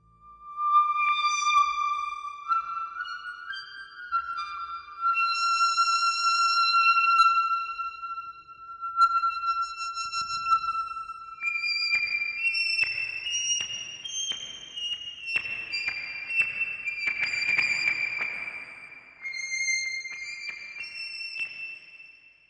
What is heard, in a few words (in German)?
filterspiel, Trautonium